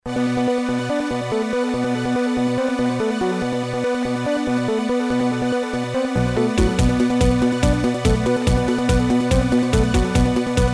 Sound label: music